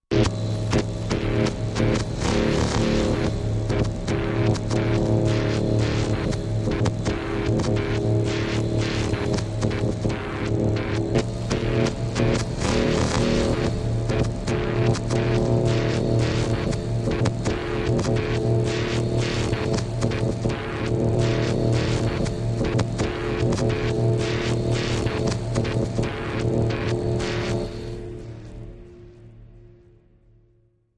Arc of Foreboding, Machine, Electric Arcs, Welding, Low Pulse